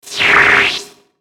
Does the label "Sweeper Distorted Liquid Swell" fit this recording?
Yes